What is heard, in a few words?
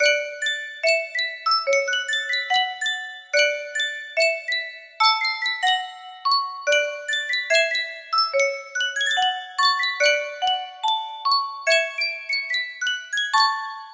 sankyo music box